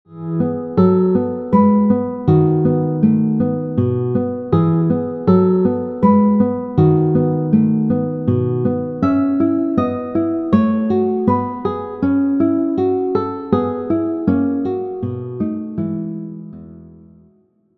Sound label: guitar; Lagrima; Tarrega; classical guitar